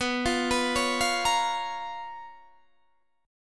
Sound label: C sharp 13th B